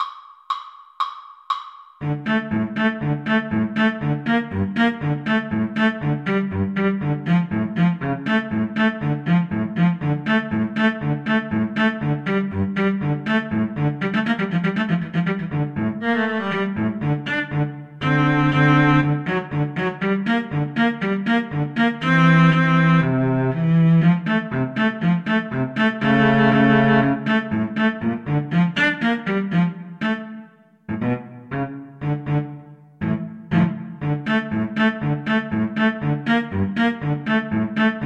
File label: Violin Cello Duet; brahms hungarian dance 5